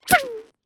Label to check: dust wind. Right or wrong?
Wrong